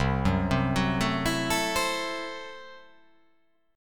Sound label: C 6th Flat 5th, C, down